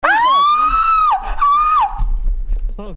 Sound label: scream